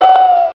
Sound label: "ooh", voice